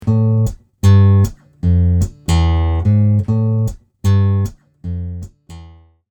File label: Base Line